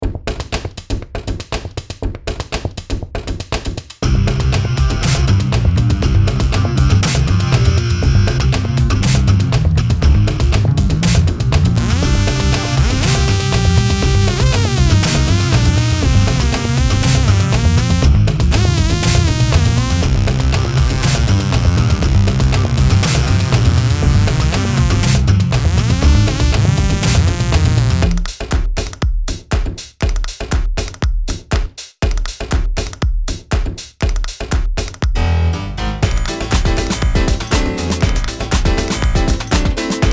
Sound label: Crunchy funk